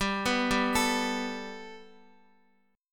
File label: G Major Add 9th Guitar, chord; Gadd9; arpeggiated